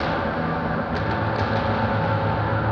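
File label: guitar pad, Drum N Bass; Sonic Foundry (Sony Creative Software); Knutrix Drum & Bass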